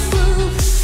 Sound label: woof